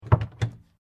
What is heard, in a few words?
Door Pivot Wooden Cupboard Small Open; Various doors